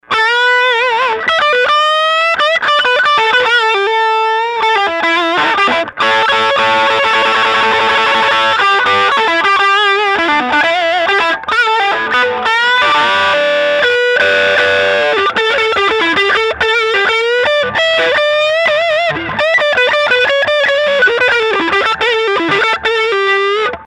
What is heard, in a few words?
vintage rat strat lead